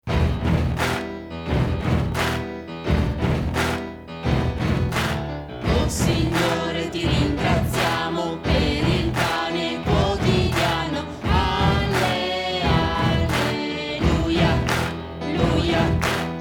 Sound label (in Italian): Alle alle luia luia